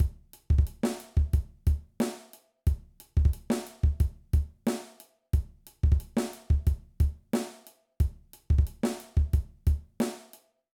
Intermediate Drum Beats

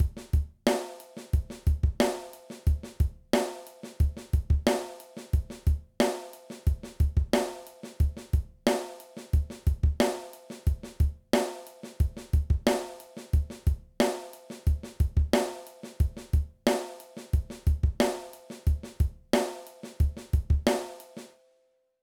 Intermediate Drum Beats

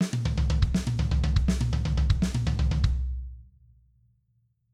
Triplet Fill, drum

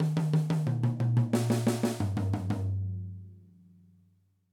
16th Note Example

